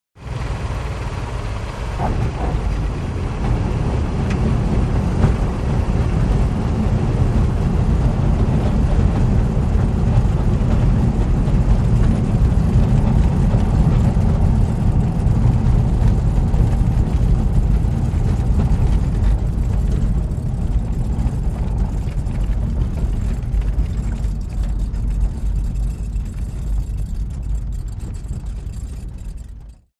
With Whirring Props. Shifting And Clanking Metal, Loud Rumble And Squeaking As Plane Taxis On Ground. Low, Steady Idle. Close Perspective. Prop Plane.; Taylorcraft: Start / Idle / Taxi